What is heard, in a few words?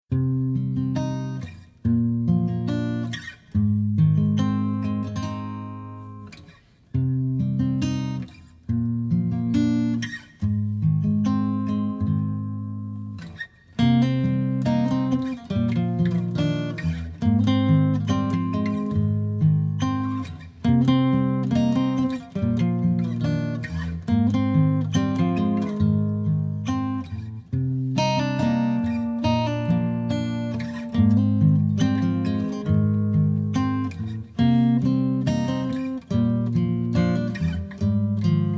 Ocean, Guitar; Guitarra-Cuerda-Piano-Ambiente